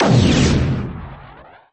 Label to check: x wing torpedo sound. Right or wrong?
Right